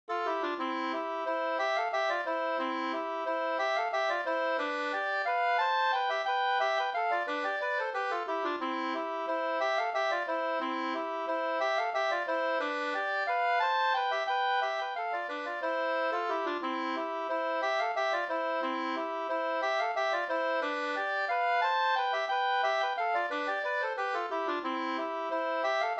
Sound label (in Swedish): vals; Gustaf Blomkvist; Stockholm